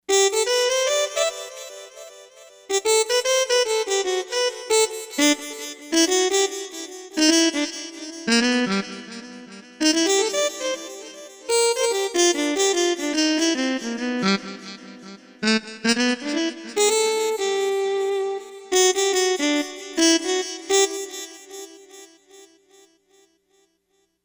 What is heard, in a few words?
Echo Mute, Korg MSC-4S Orchestra; Orchestra 1